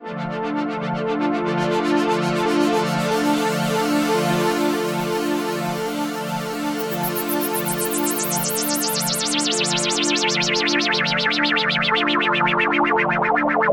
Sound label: Juno 6 Res; UAD Apollo